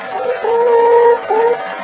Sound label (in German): huhn